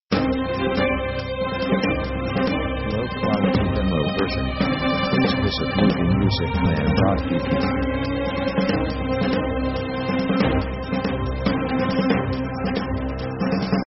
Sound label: orc chasing action